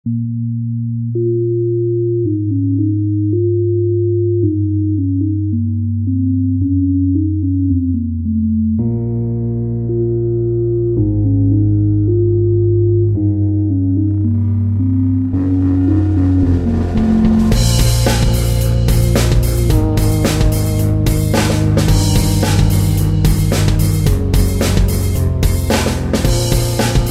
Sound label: Synth; Gem Hunter